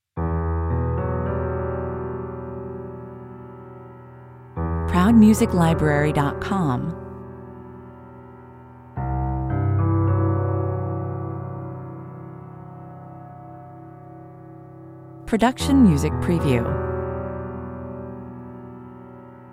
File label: decisions (piano and subtle bass melody)